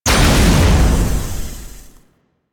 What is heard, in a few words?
Portal Transition Stone To Open